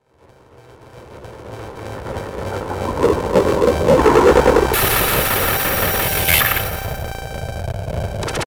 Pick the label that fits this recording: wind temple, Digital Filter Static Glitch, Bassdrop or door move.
Digital Filter Static Glitch